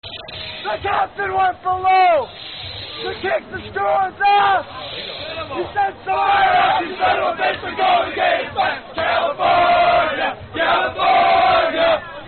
California Drinking Song With Strange Intro; Cal Fans In Kaiserslautern

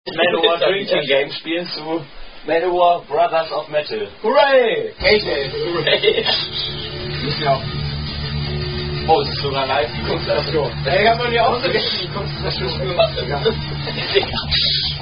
Keyword Drinking Game Done By Germans

